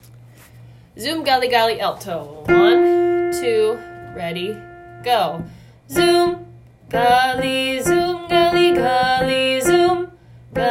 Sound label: Zum Gali Alto